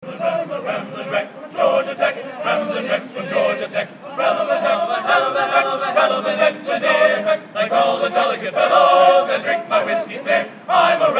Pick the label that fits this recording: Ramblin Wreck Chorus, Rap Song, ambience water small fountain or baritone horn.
Ramblin Wreck Chorus